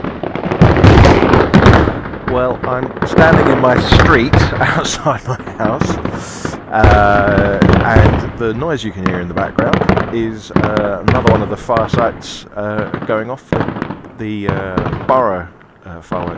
more fireworks

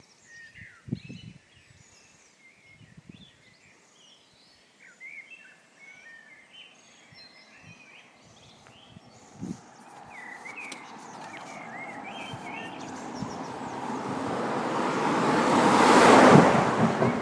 dawn chorus

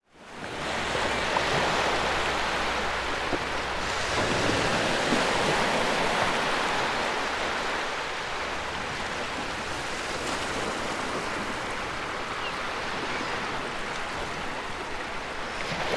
gentle waves seagulls; sandsend

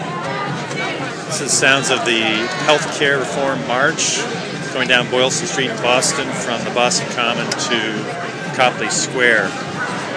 sounds of health care reform march

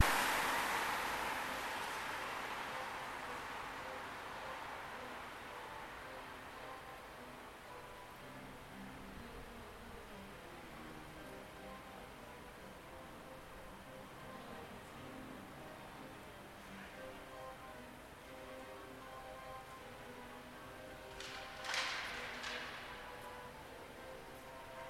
piano across the street

